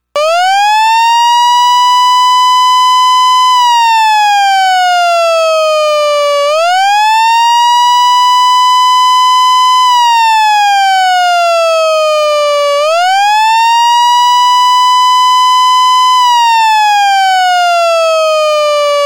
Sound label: siren